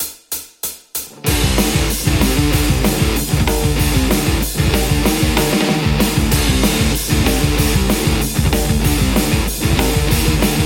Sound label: metal Les Paul; antti saro